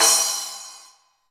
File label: Splash Cymbal